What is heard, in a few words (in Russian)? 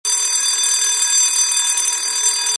shkoln zvonok